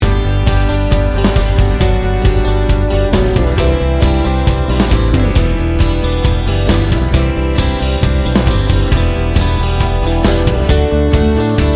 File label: open road loop